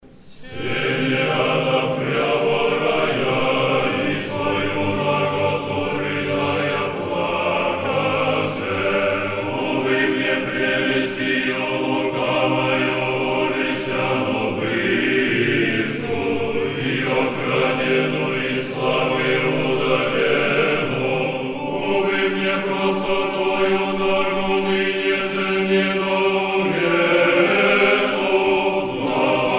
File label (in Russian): Хор, Песнопения, Стихира; Песнопения Недели сыропустной; Стихира на Господи воззвах